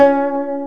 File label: KOTO C#2, ETHNIC; AKAI S-Series CD-ROM Sound Library VOL-8